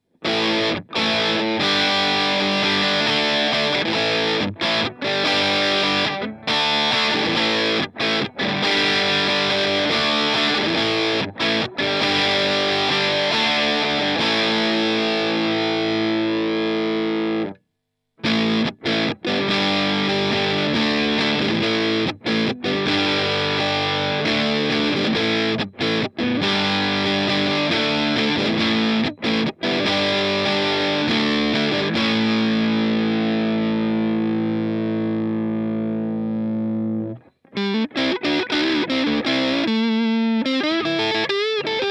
lead LP

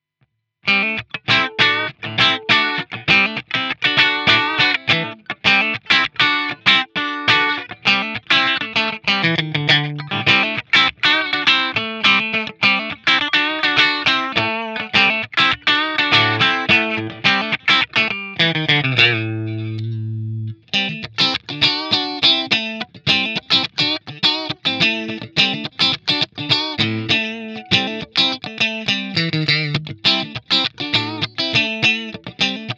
Rhythm Split

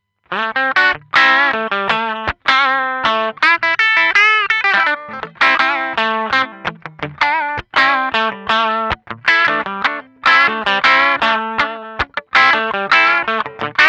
Rhythm

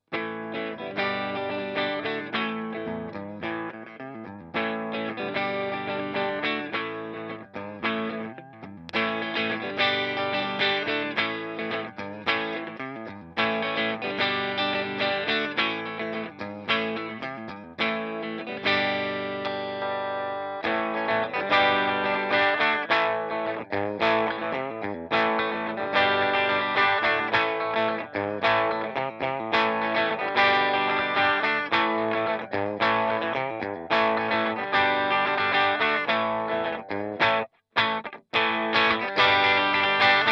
clean rhythm LP

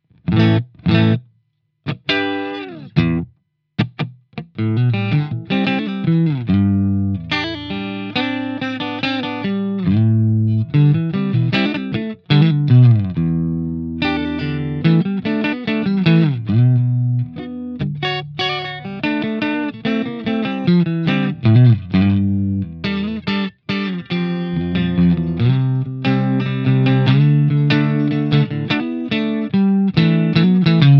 Clean split

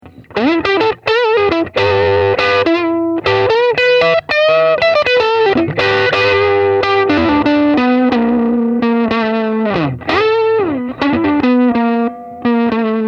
Crunch

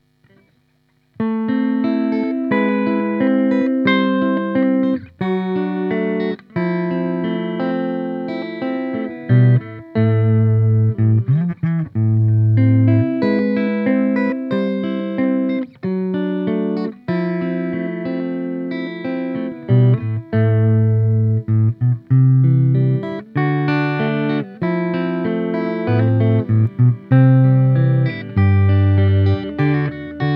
LP Clean; lampes

